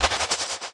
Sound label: Hat; Touch Loops; BlissedOutBeats; One Shots; Drums